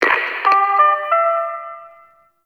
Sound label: Chimes In; Cinematics; Warframe; TheNewWar; Zariman